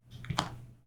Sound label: footstep tile; UltimateFishing2020